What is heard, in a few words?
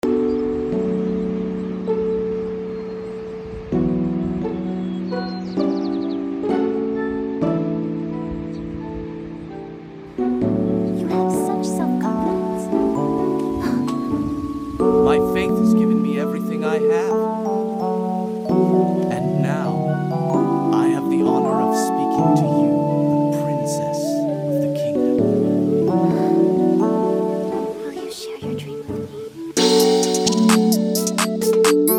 plugg loop